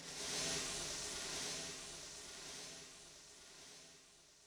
Yamaha DTXpress, Electric Snare; drum machine; Brsh Swep

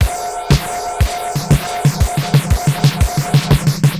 Distorted TR 505 (120BPM), beats; musicradar; cyborg beats

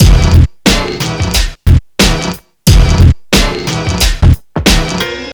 Club Tip, R&B Drums

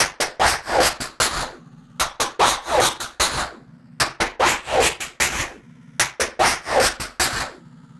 NRG SFX, Trance

